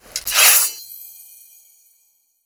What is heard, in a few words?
warrior draw sword